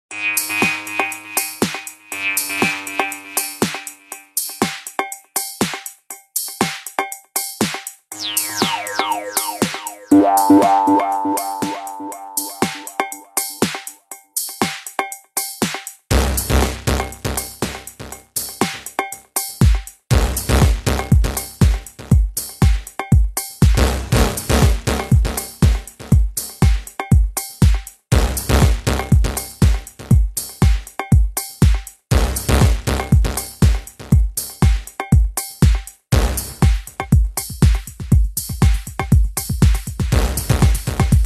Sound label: tribal